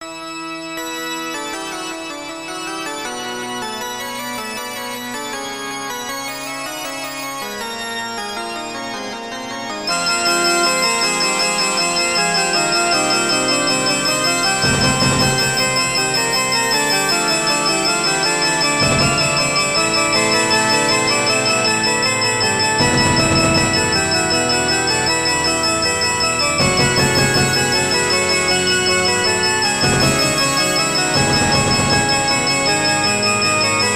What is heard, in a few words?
karaoke